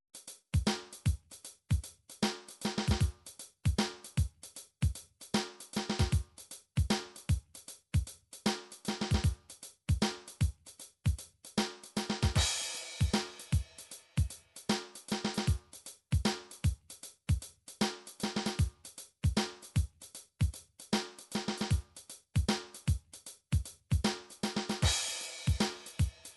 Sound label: midi drums